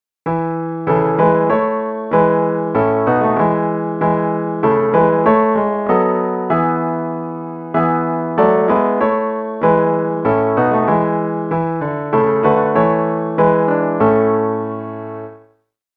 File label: MELODY